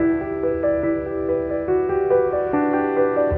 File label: piano, sad loop